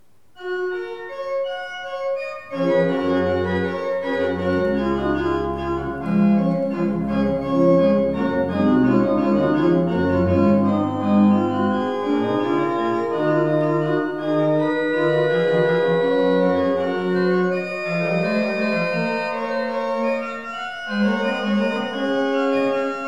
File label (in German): Improvisation über das Thema des 1. Satzes des Brandenburgischen Konzertes Bachs in F-Dur; Soiree 2018